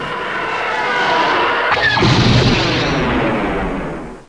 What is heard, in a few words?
shooting